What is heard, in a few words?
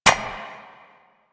bird three single step; Ambience